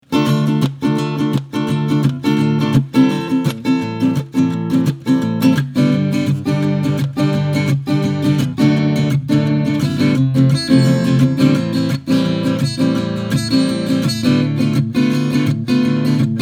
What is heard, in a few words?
Cort Gold Mini Strumming